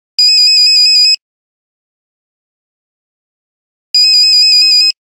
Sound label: 5110 Mosquito, Nokia Buzzer; Windows Phone apps